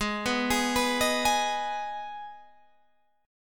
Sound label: Ab Minor Ab down Guitar Open G